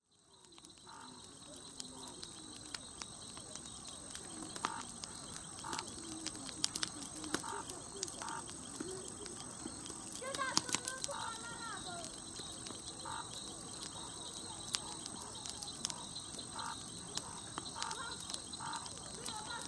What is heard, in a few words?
Caravan Ambience